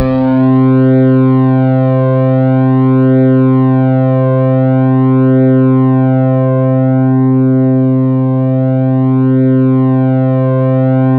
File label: C3, SynthLine